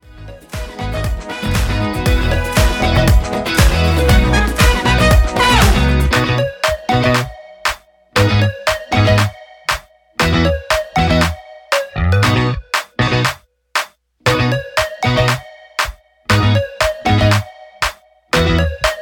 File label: Instrumental